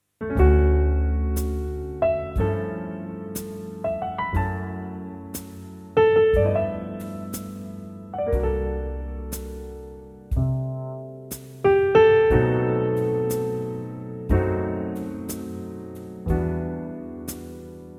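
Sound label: backing track; key - D to Eb - vocal range - A to D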